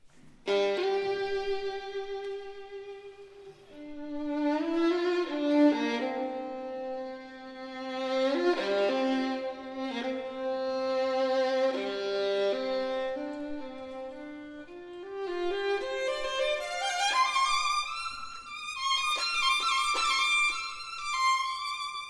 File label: duo for violin and cello maestoso e largamente ma non troppo lento presto